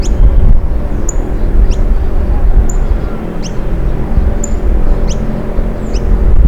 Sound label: Sicalis flaveola male call